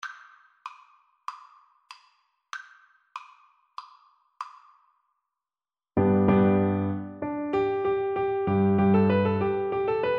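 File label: recorder karaoke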